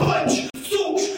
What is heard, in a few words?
punch socos